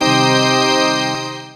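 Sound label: synth huge; Best Service Gigapack 1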